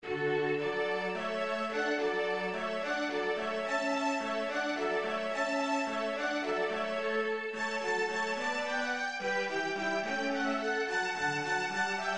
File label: hornpipe; Haendel